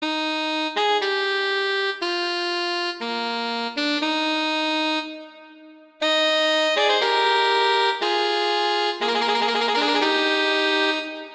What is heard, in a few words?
Largo; Telemann; Canonic; Sonata 6